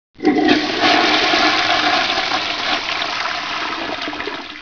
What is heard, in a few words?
flush; cstrike